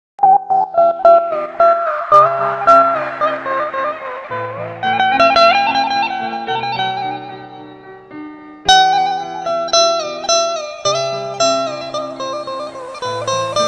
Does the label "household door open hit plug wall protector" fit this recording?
No